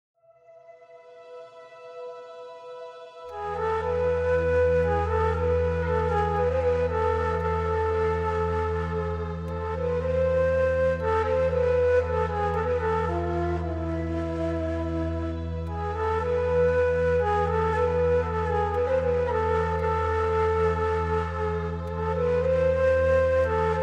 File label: Kaval